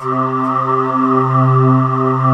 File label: BREATH; East West; Global Sounds